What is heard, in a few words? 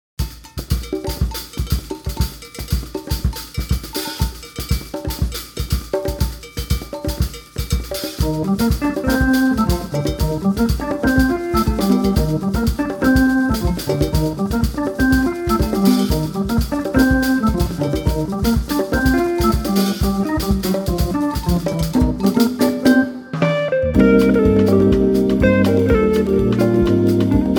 Samba